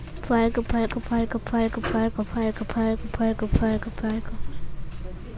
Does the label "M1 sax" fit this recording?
No